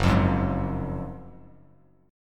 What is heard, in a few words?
Gb Major 7th Suspended 2nd, down, Bass Standard; strummed; JGuitar